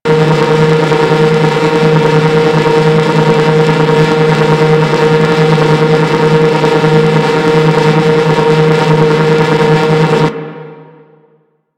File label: Derelict Pod Malfunction Track Loop; Ambience; Props; Warframe; Orokin Derelict